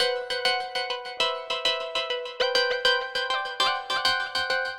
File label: synth Liquid Keys 100bpm G